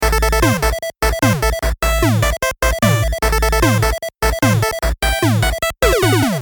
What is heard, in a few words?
Short 8Bit